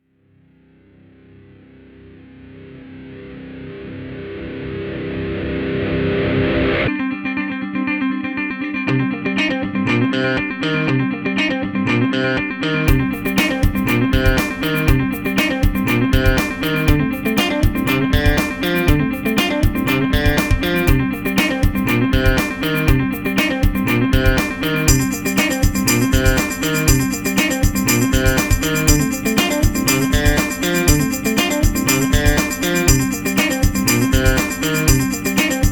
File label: Funky